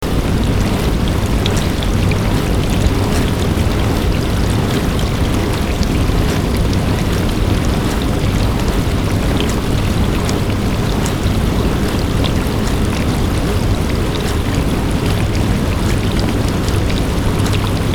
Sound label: Calming Dishwasher